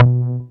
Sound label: Basical, baesse